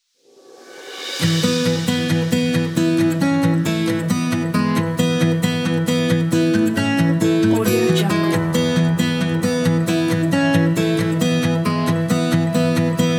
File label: happy motivational kit